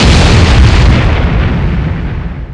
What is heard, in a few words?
explo; Messiah; WEAPON; XPLODE